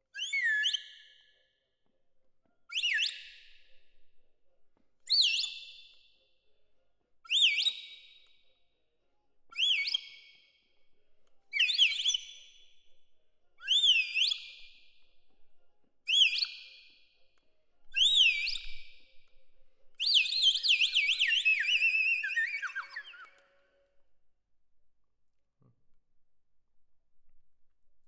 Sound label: Perc Close